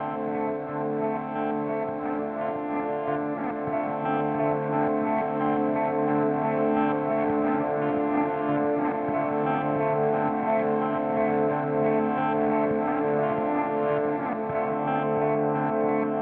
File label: Timeline Bucket; Strymon